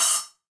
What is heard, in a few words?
Cymbal B Stick Stop; Latin Percussion; Drums; Drum Hits; Ableton; Factory Packs